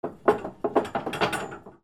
BANGS

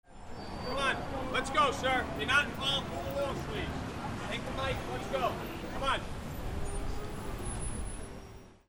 PEOPLE

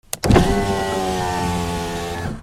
CARS

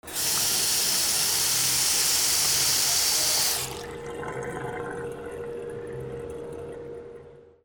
BATHROOM

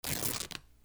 RIPPING

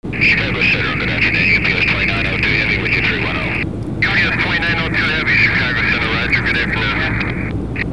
flight

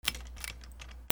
CLICKS

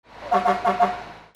VEHICLES

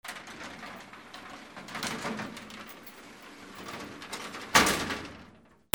INDUSTRY

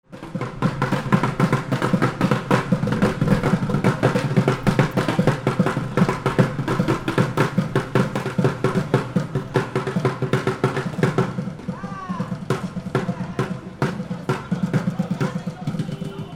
AMBIENCE

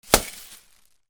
BREAKING

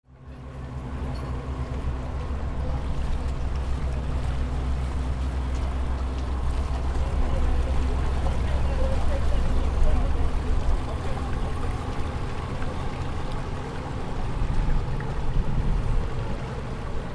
BOATS

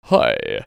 CARTOON

